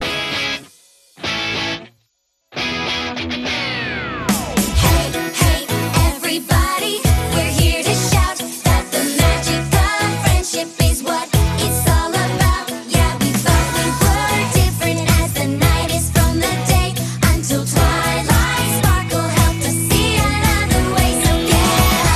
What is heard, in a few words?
Cafeteria Song; My Little Pony Magic Princess; Equestria Girls